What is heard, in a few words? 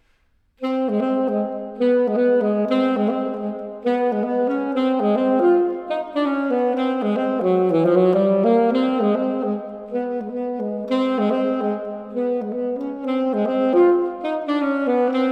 Glass Melody